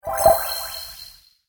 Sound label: Game Sand Timer Bonus; Video Game Sound Effects